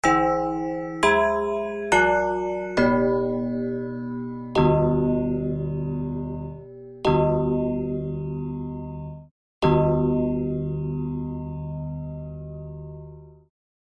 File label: orchestral tubular bells